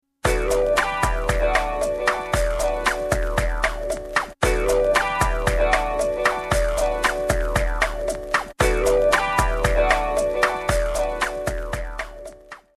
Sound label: Groovy String